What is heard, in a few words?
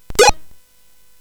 Tecnetium, CSG8580R5; hvsc